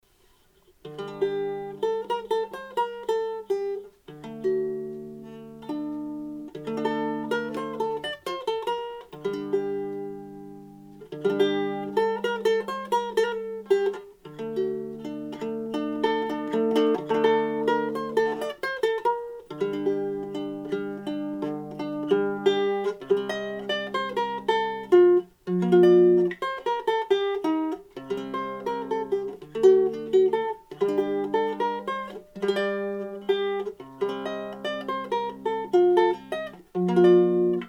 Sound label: Melodija Xiao Ruan, bandurka